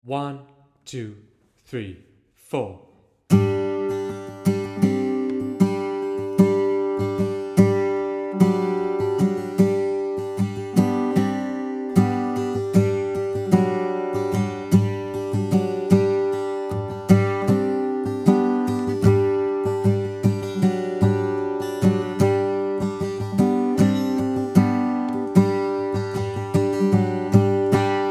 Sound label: Guitar Steady